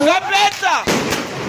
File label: boom; gambeta